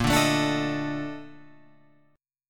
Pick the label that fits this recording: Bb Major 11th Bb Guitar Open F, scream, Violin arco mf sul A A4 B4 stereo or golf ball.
Bb Major 11th Bb Guitar Open F